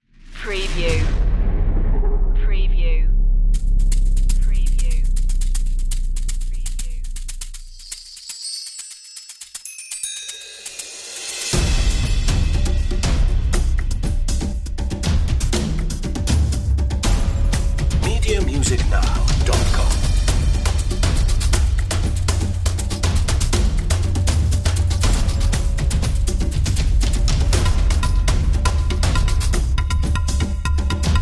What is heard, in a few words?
percussion only